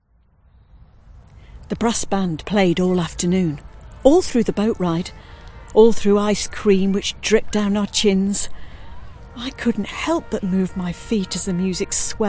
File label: woman solo